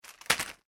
packaging plastic drop, Plastic Packaging Hits Floor; Plastic Packet / Packaging Hits Floor; Materials; foley; McKinney Sound